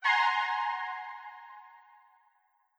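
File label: Goddess Sceptre Light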